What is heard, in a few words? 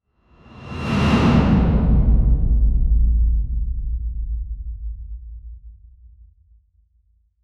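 a deep ominous dark swoosh; energy sweep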